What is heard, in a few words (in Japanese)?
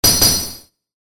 ランク レア度2